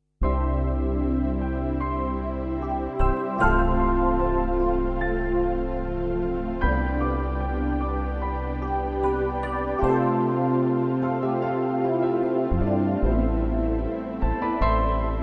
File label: Psalm 23